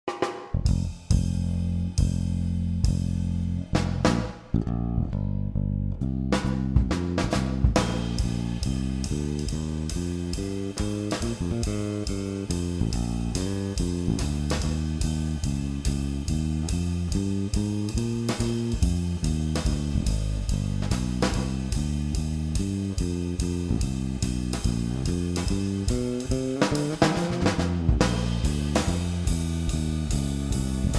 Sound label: Bb Blues Improv (no piano); jazz audition etudes